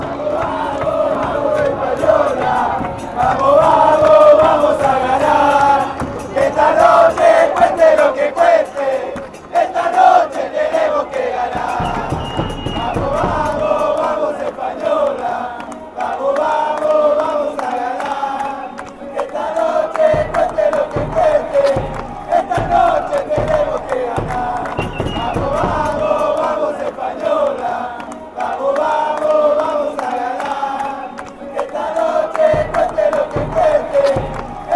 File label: come come espanaeola ue, fanchants